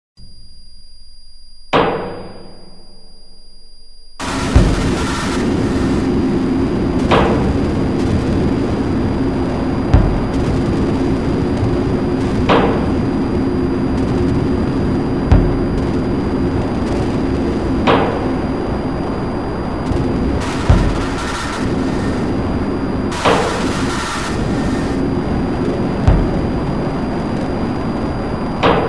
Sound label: Dark Ambient